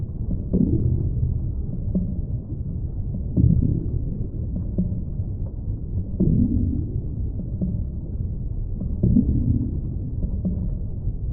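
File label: tiyanki idle loop; Stellaris; reanimated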